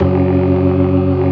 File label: organ, all stops; Keith Emerson Signature Series